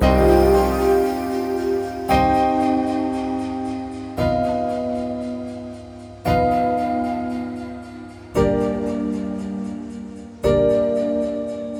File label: Loop 115bpm; Svaior; jenny leclue detectiv